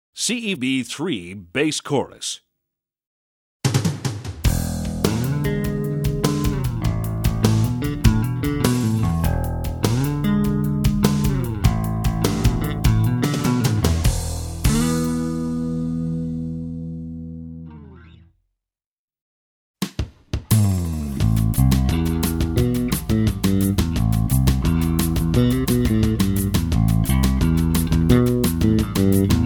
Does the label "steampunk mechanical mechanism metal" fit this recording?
No